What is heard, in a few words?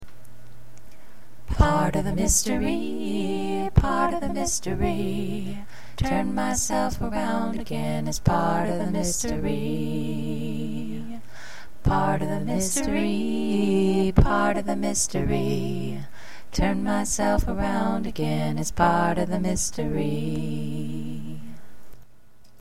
all 3 harmonies